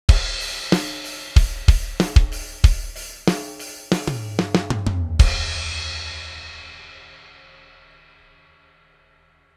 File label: Tone Kit Loop